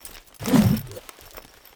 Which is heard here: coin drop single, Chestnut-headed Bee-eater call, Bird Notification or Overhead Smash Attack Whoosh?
Overhead Smash Attack Whoosh